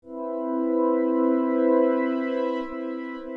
atmos

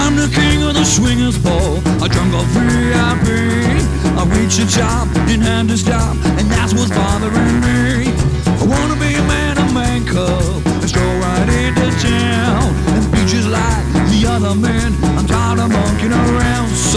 monkey song

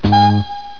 weird note

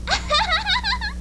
Laugh

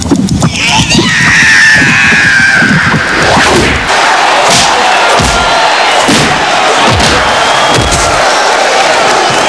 fight

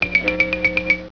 twitch

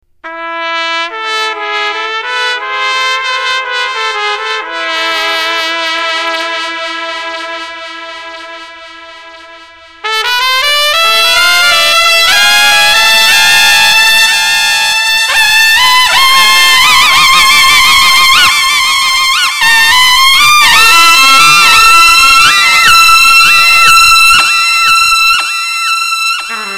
echo

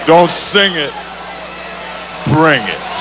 hall

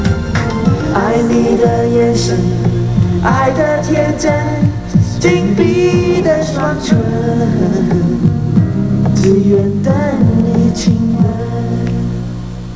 Chinese duet

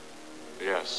firestorm

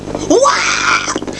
YELL

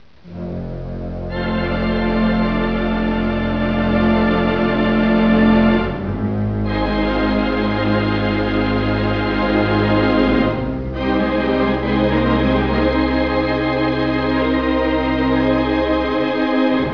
organ

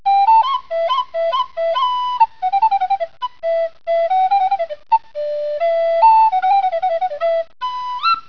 recorder soprano

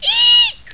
eek

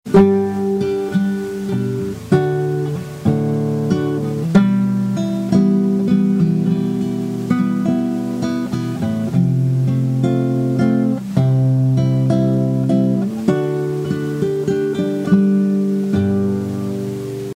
Hohner Contessa HC394, guitar; This guitar is a 'garage sale special'.

